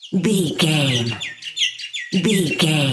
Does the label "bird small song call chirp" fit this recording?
Yes